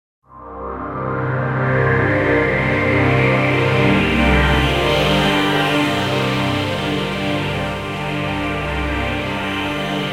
Wolken Modelle Resynator Mod; Indigo

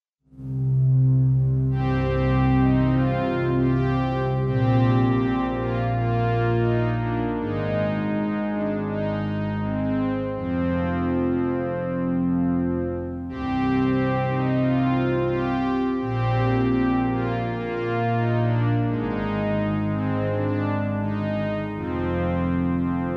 n6 schön analog; tyrell

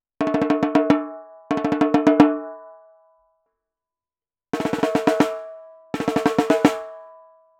CC 57 Kondensator Mikrofon Pearl Snare 10x 5 Firecracker Snare close; the t.bone

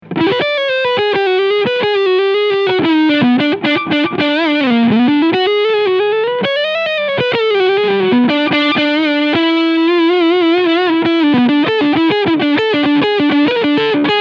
Ibanez AM93ME NT Leadsound Front PU

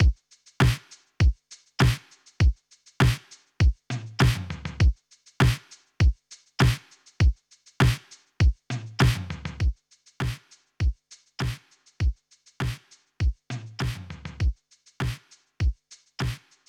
Kompressor 8 1 mit THRUST

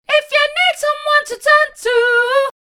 Mastertake und Double Take; Mit ReVoice Pro bearbeitet

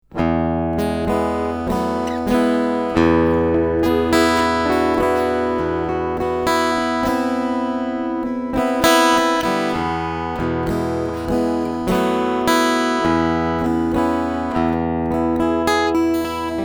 Picking EQ 12 Uhr

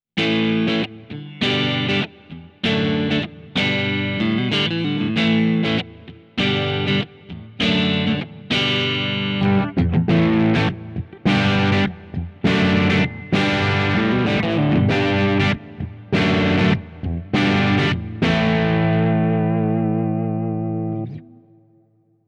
Spaceman Sputnik III Clean Referenz

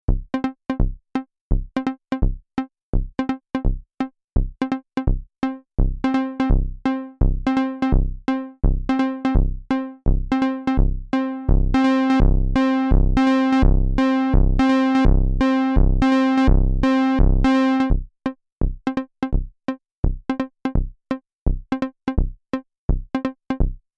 1073 Preamp Vergleich Neve 1073DPA Synth 15 dB

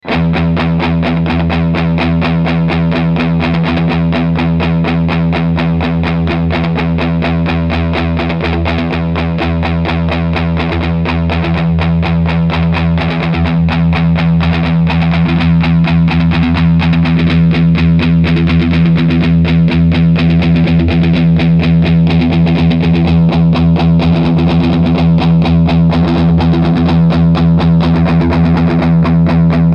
Mittenfahrt

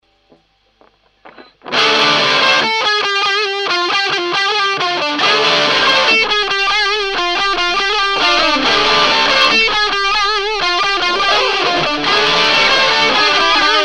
Steg Pick Up Gain; Iron Cross Signature

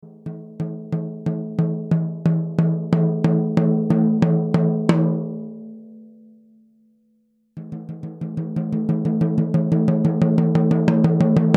14x65 Oak Snare Recordings Tief

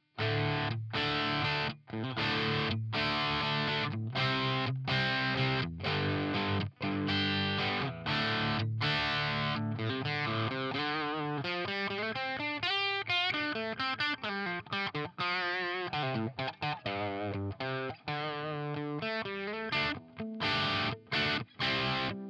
Harley Benton CST 24HB dist SPLIT steg

